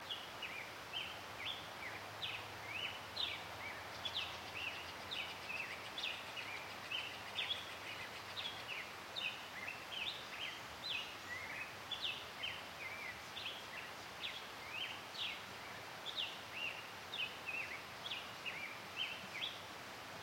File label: Bird Three